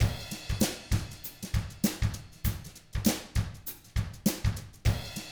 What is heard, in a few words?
FUNKY, Drums